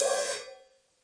crash cymbal choke supersoft, DRUMS (SINGLE HITS), Crashes